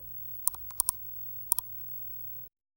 game button soft, button press

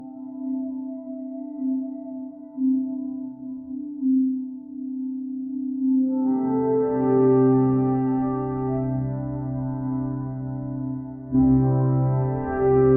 minecraft style titl, game music

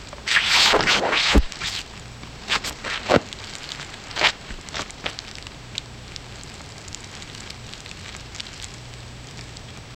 sticky squelches as boots

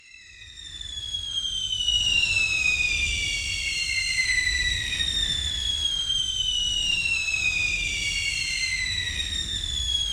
particle shimmering mysti; festive

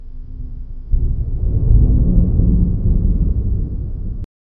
low ominous wind swells a; cawing

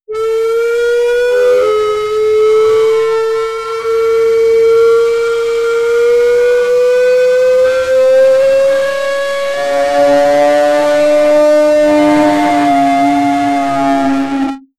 Dodge Charger SRT Hellcat, muscle cars

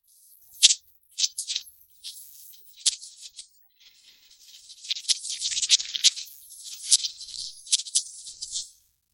giant spider shooting spi

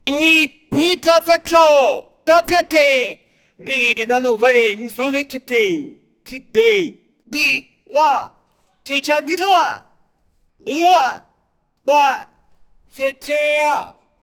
a teenager japanese male, martial arts

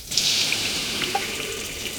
water splashes over raw; water effects

